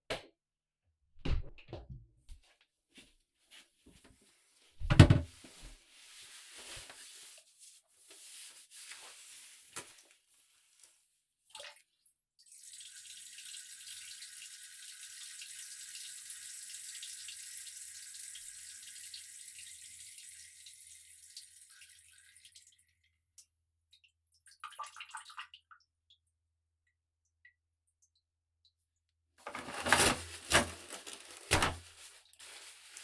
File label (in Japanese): おしっこ, ASMR